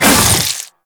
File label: Fast Whoosh, Weapons; Infested; Coda Pathocyst